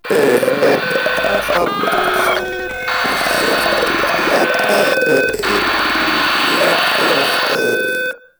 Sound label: Proto Infested Runner Idle, Vocals; Warframe